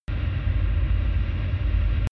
drone spaceship rumble hard

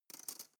cutlery teaspoon drawer place soft

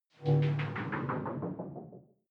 sci-fi critical bell bright

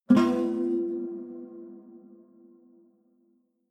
low string buzz dissonant high

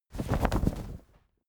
flag small flap

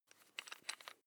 electric drill bit unfasten wrench turn

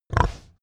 pig grunt

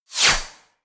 wipe

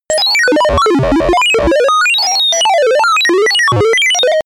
chaos sine distorted

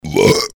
belching

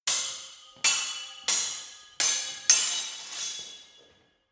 sword hit sword sequence

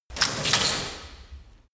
chain mail drop

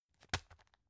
tissue package drop soft

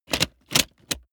plastic mechanical handling lock

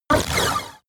sci-fi laser shot short

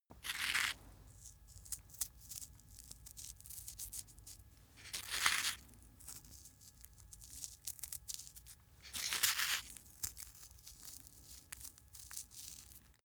small animal eat crunch

